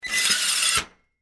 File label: Iron Board Fold, Household, Metal, Squeak; Laundry Sound Effects; BlastWave